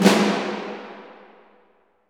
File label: SNR, Orch Snares; Roland LCDP03 Orchestral Perc; 90 s Sample CDs